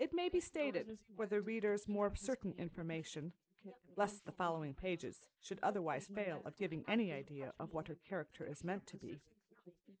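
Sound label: female, instantaneous mixture; SiSEC11; underdetermined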